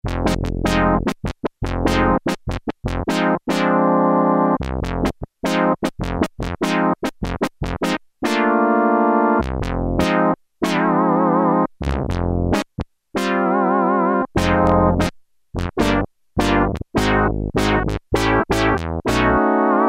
Juicy Funk, Roland JX-3P

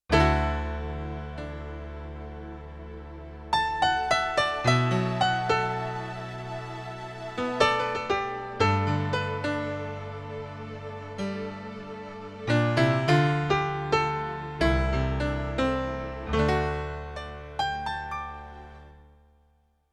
Strings & Piano, Yamaha TX16W; Orchestral Performance 2

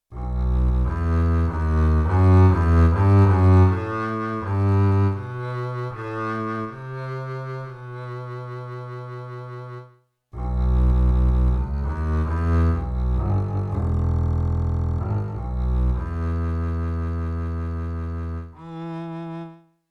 Contra Bass

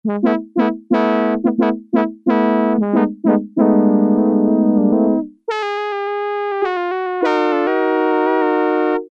BRASS, Yamaha DX7

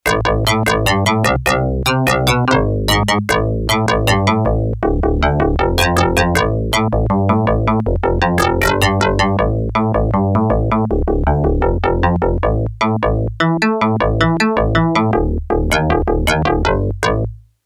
Hard Bass, Yamaha TX802; Factory Patches; Voices

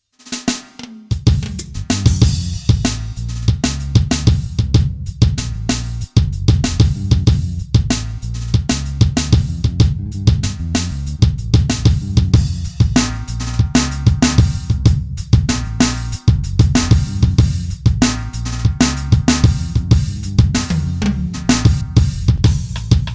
Standard, Akai MPC1000

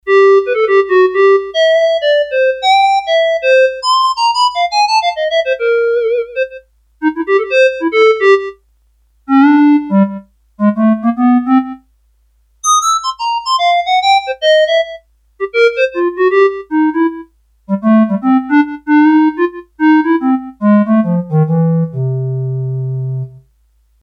Clarinet, Korg DS-8; Factory Patches

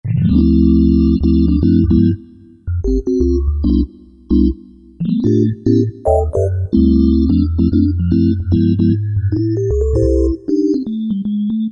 Jazz Organ, Korg N364; Factory Prog demos

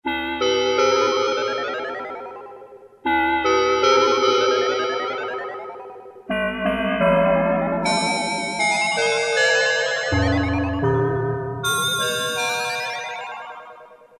SPACE BELL; Akai SG01v

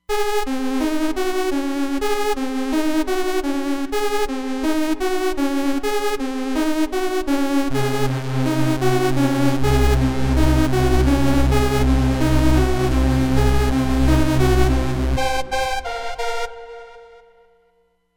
Techno Wave, Roland Fantom XR

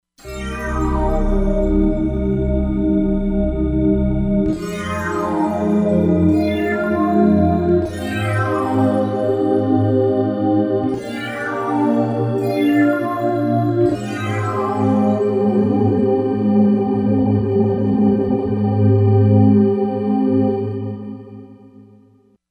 Enchanted, Korg MSC-07S Synth combination; Korg MSC-07S Synth 2

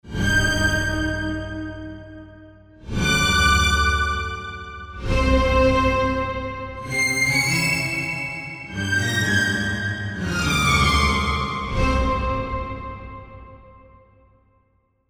Orch Hit FX, Korg MSC-1S; Korg MSC-1S Variety

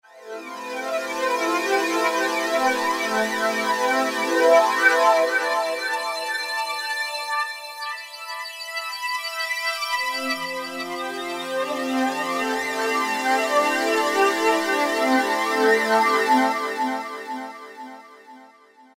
Flanger 5th Pad, Korg microKORG; Factory Voices

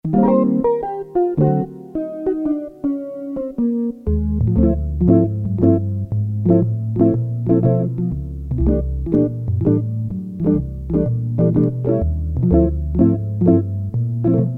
Jazz Guitar, Casio CT-370; Tones

